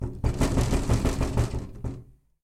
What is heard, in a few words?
Rattling Window; SyrinScape; City gone mad